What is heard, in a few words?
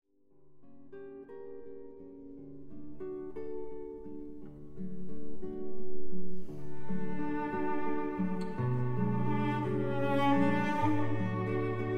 Guitar Cello; Franz Schubert; Ave Maria D. 839